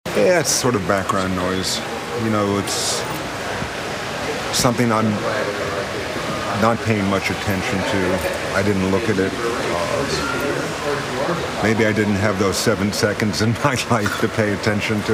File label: Background Noise